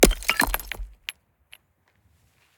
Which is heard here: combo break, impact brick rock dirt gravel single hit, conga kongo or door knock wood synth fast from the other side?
impact brick rock dirt gravel single hit